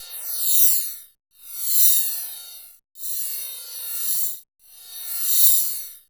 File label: METAL SWIRLS; Spectrasonic Distorted Reality 1